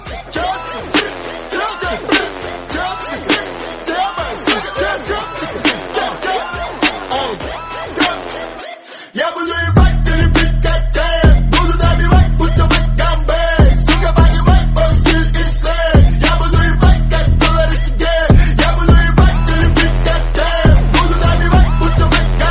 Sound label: dj remix song tone ringtone